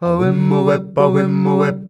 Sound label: "AWIMBA", F#, males; Best Service; Hyper Dance